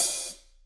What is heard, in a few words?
HH, Drums; Bob Clearmountain Drums I